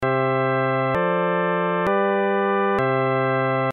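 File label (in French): sixte ajoutee modern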